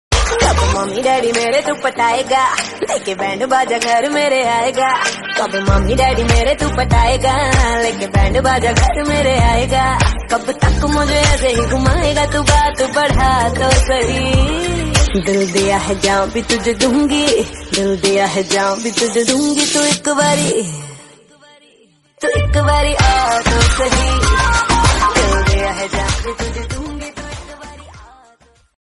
cool dance bollywood song tone ringtone

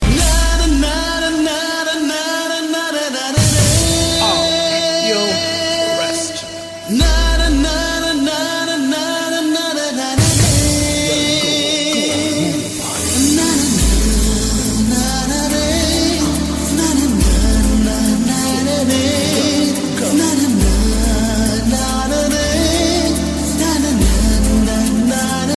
tamil nepali ringtone

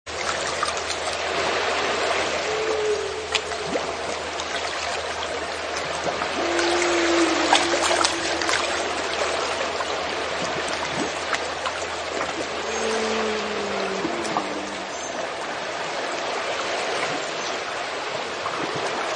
cute sea whale sound tone

